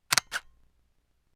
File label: Shutter; LG; B470; Camera Shutter Sounds